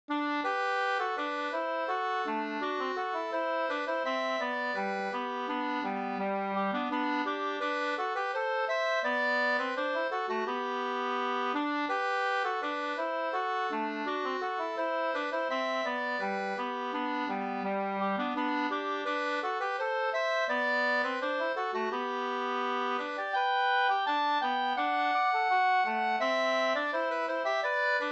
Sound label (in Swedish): skanklat